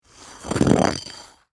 Ice Object Drag, Across, Ice; Texture; Dragging (Sound Effects:Objects)

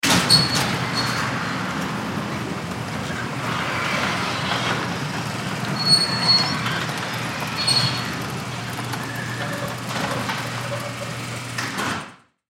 Fire Station, Fire Station Garage Door Close; Fire Station Garage Door Close Distant; Emergency Vehicle Sound Effects

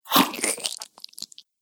Sword Hacks Victim Blood Splatters; battle; Battle Sound Effects; Andrew Fleming

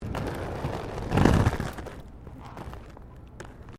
ROLLERBLADING TRAFFIC BUMPS STOPPING; Sports

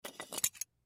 Scissors Pick Up Table; Offices; Foley; Blastwave

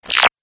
Alien Wet Element; Alien Sound Effects

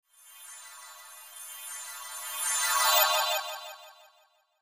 Magical Crescendo Conjure Twinkle, Cartoon

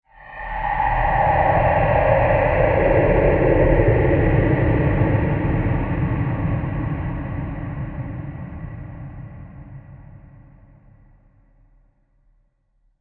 Breathy Creature Transitions With Huge Reverb; Scary Soundscape

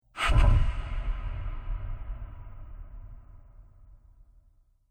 Monster Sniggers; Monster Sound Effects; Andrew Fleming

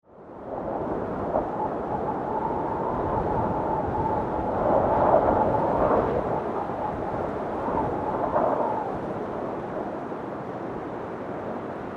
Wind Storm, Weather, Wind, Storm

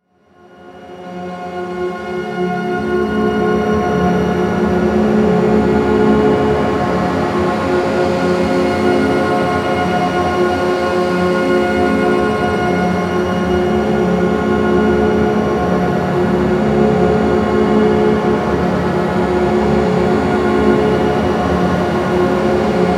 Bed Musical Bright Shimmer Feedback Delay; Composition; Production Element Imaging Element; 5.1 STEREO MIX; Blastwave

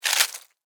Insect Eat Chew, Bite, Crunch, Bug; Animal; Insects Eating; BlastWave

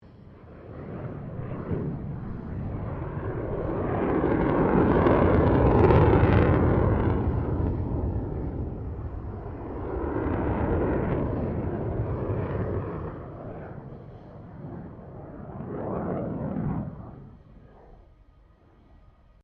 AIRCRAFT F16 FALCON MANEAUVERING; Airplanes (Sound Effects:Vehicles)

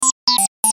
Logo Beep Melody; Sonic Logo Sound Effects; Production Element Imaging Element Accent Transition

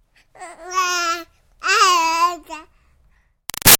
Baby 6 Month Old Talk Short; Voice; Babies; Big Room Sound

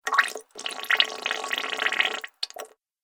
Water Fast Little Bubbles

